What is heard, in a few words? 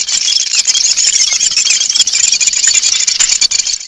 gragger